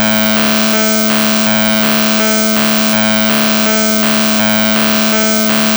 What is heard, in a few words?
square